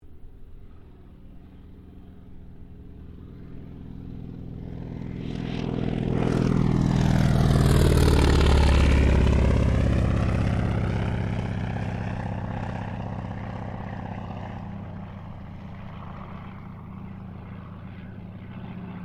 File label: AIRCRAFT CAC WIRRAWAY PASS; CA-16 Wirraway; Airplanes; Vehicles